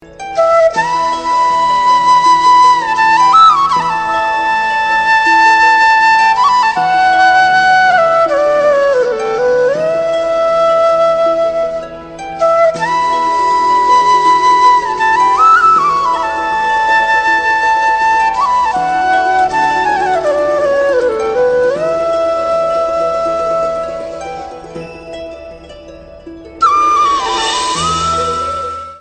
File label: flute ringtone; mahabharat